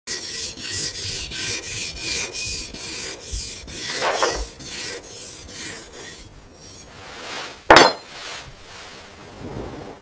Sharpening blade

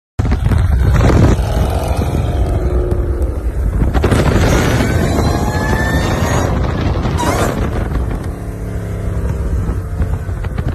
Jeep Grand Cherokee

